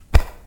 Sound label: catch